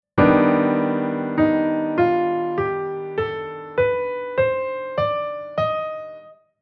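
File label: Chord Scale Minor Melodic Minor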